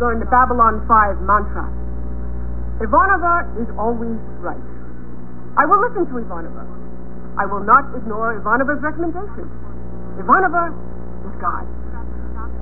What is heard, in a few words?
Mantra